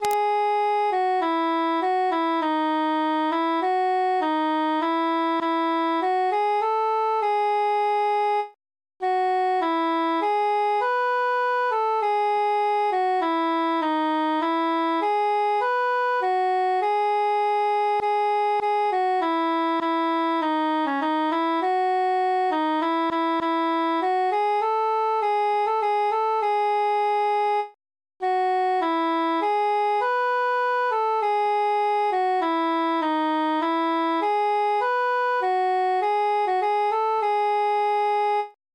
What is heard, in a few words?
altus solo; transposed; we be three poore mariners